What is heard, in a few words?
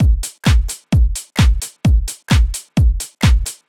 Drum Loop 130, Downtown House; Drum Loops 130bpm